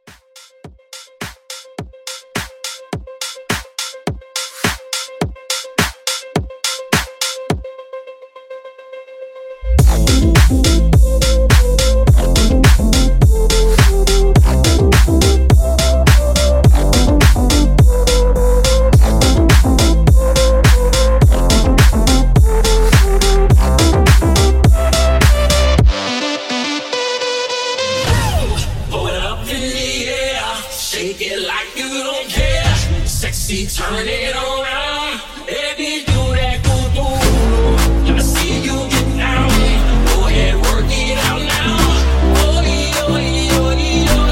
Transition 105 128

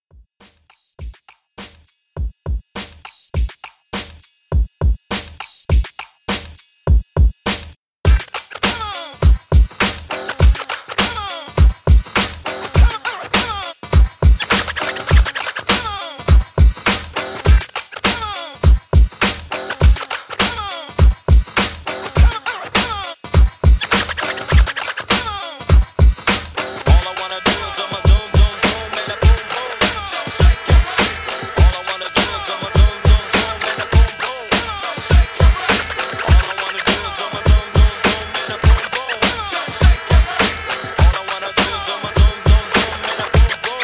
Classic Redrum 102Bpm Clean

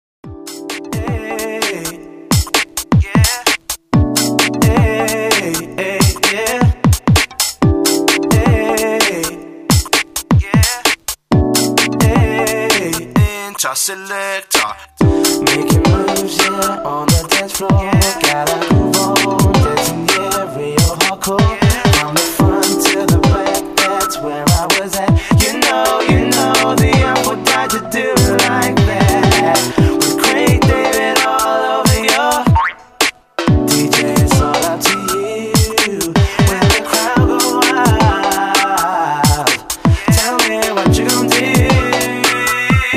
130 bpm

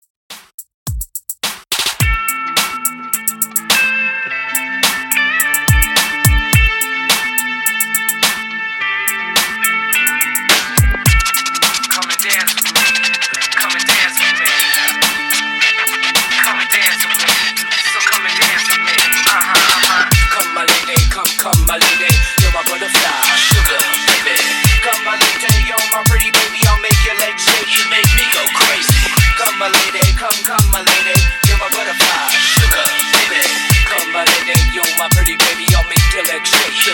Oldschool 106 BPM; ReDrum

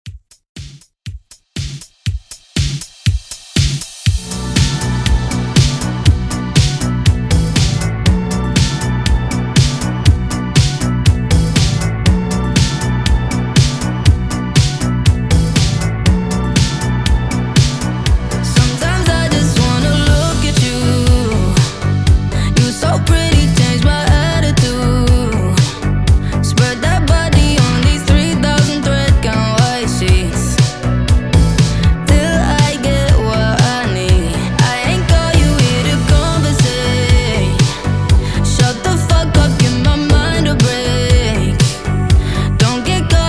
REDRUM 120 BPM

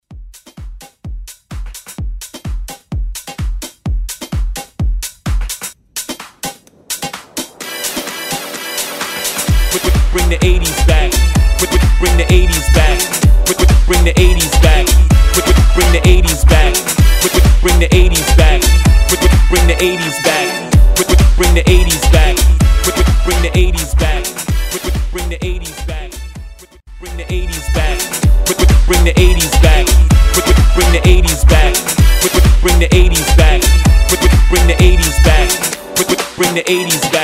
80s Tribal Partybreak 128

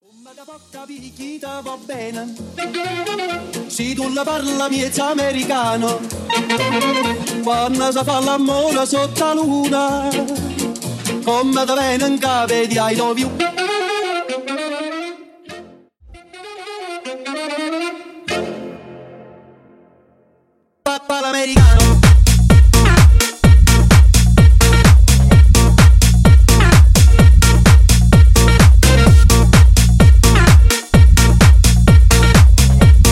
Tech House Intro

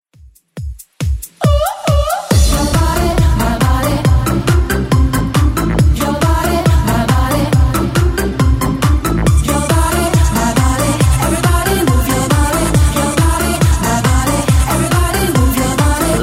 90s Redrum 138bpm

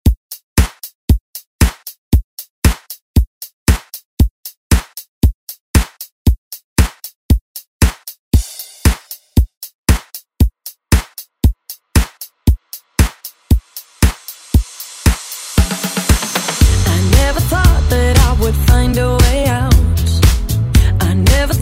Pop Redrum

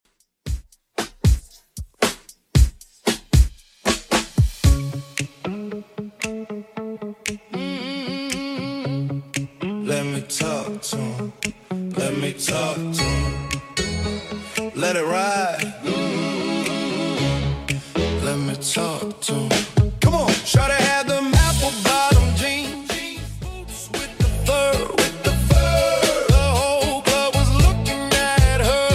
70s Soul Funk Redrum 115bpm

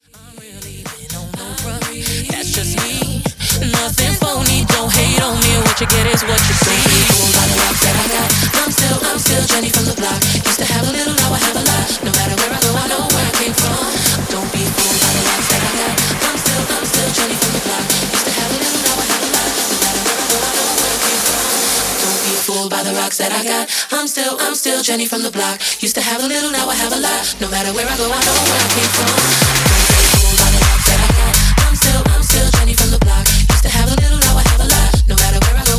Tech House

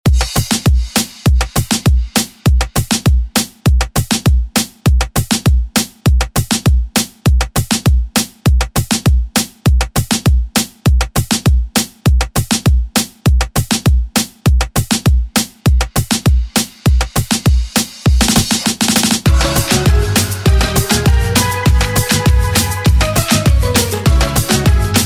Oldschool Redrum; Deejay Hit

